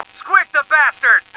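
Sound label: squikked, male; stars; taunts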